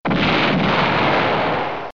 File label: Explosion